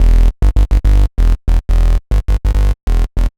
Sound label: Bass, uk garage, 142bpm; Szeet